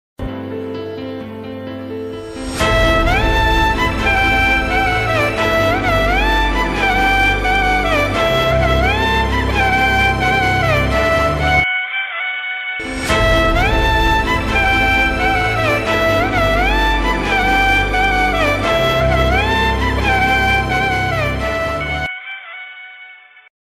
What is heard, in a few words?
sad bgm ringtone; back benchers